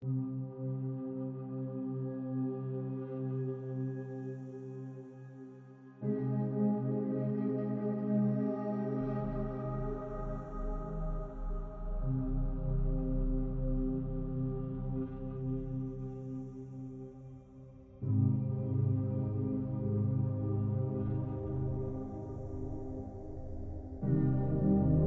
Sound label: ambient